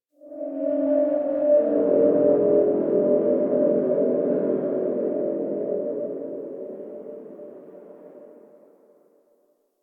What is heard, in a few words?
Eerie Drone H; Warframe; Ambience; NineteenNinetyNine